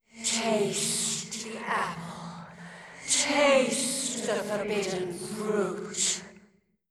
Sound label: Light Noise Smoke